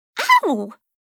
VOX Get Hit; Li Li; Heroes of the Storm; warcraft

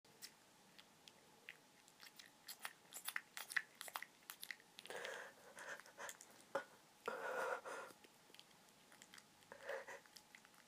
Sneaky Sounds, squishy noises, wet sounds; F4A; first fap audio

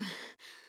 Breath; Lewd SFX

